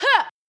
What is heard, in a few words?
combo, onehand sword; warrior